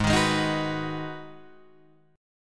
G sharp Minor 7th G sharp, Guitar Open D

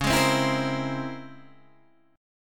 C sharp Minor Major 13th C sharp Guitar Open D

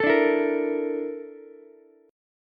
Bb Major 7th Flat 5th Bb Ukulele; BbM7b5 strummed; JGuitar

